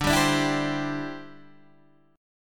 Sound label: D 9th Suspended 4th D down Guitar Open D